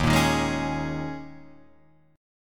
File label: D sharp 7th Suspended 4th, Guitar, Open F; D#7sus4 strummed; JGuitar